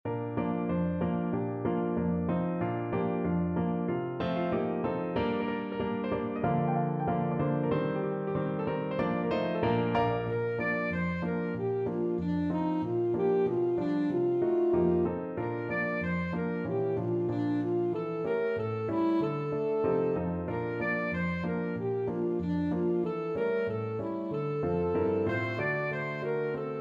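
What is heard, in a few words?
alto saxophone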